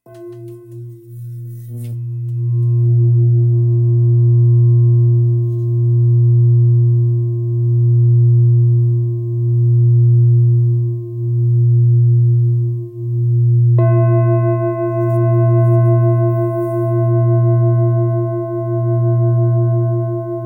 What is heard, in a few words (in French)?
Bol bengale fa